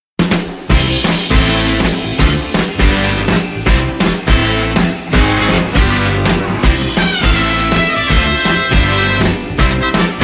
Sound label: Jingle Bells